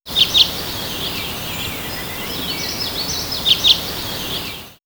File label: Nature Meadow; X-Static Goldmine